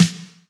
Snares; Vengeance Samples; VEH2

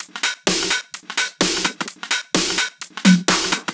Houseloops 128BPM; Vengeance Samples

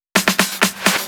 Fill 128 BPM; Vengeance Samples; VEH1 Loops

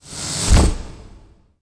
Impact; Vengeance essential club sounds-3

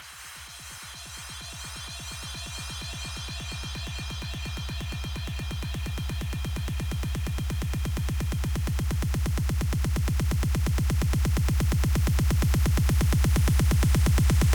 128BPM, House Electro Fills; Lexmatic; Vengeance Samples

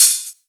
Open Hihat; Vengeance Samples; VEE2 Cymbals

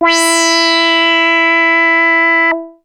Arp A E4, ARP Odyssey synth; musicradar-synth-samples

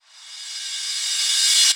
Reverse Cymbal; Vengeance Samples; VEH1 Cymbals

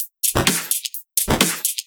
Electro Loop 128BPM; Vengeance Samples

